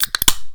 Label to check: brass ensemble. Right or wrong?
Wrong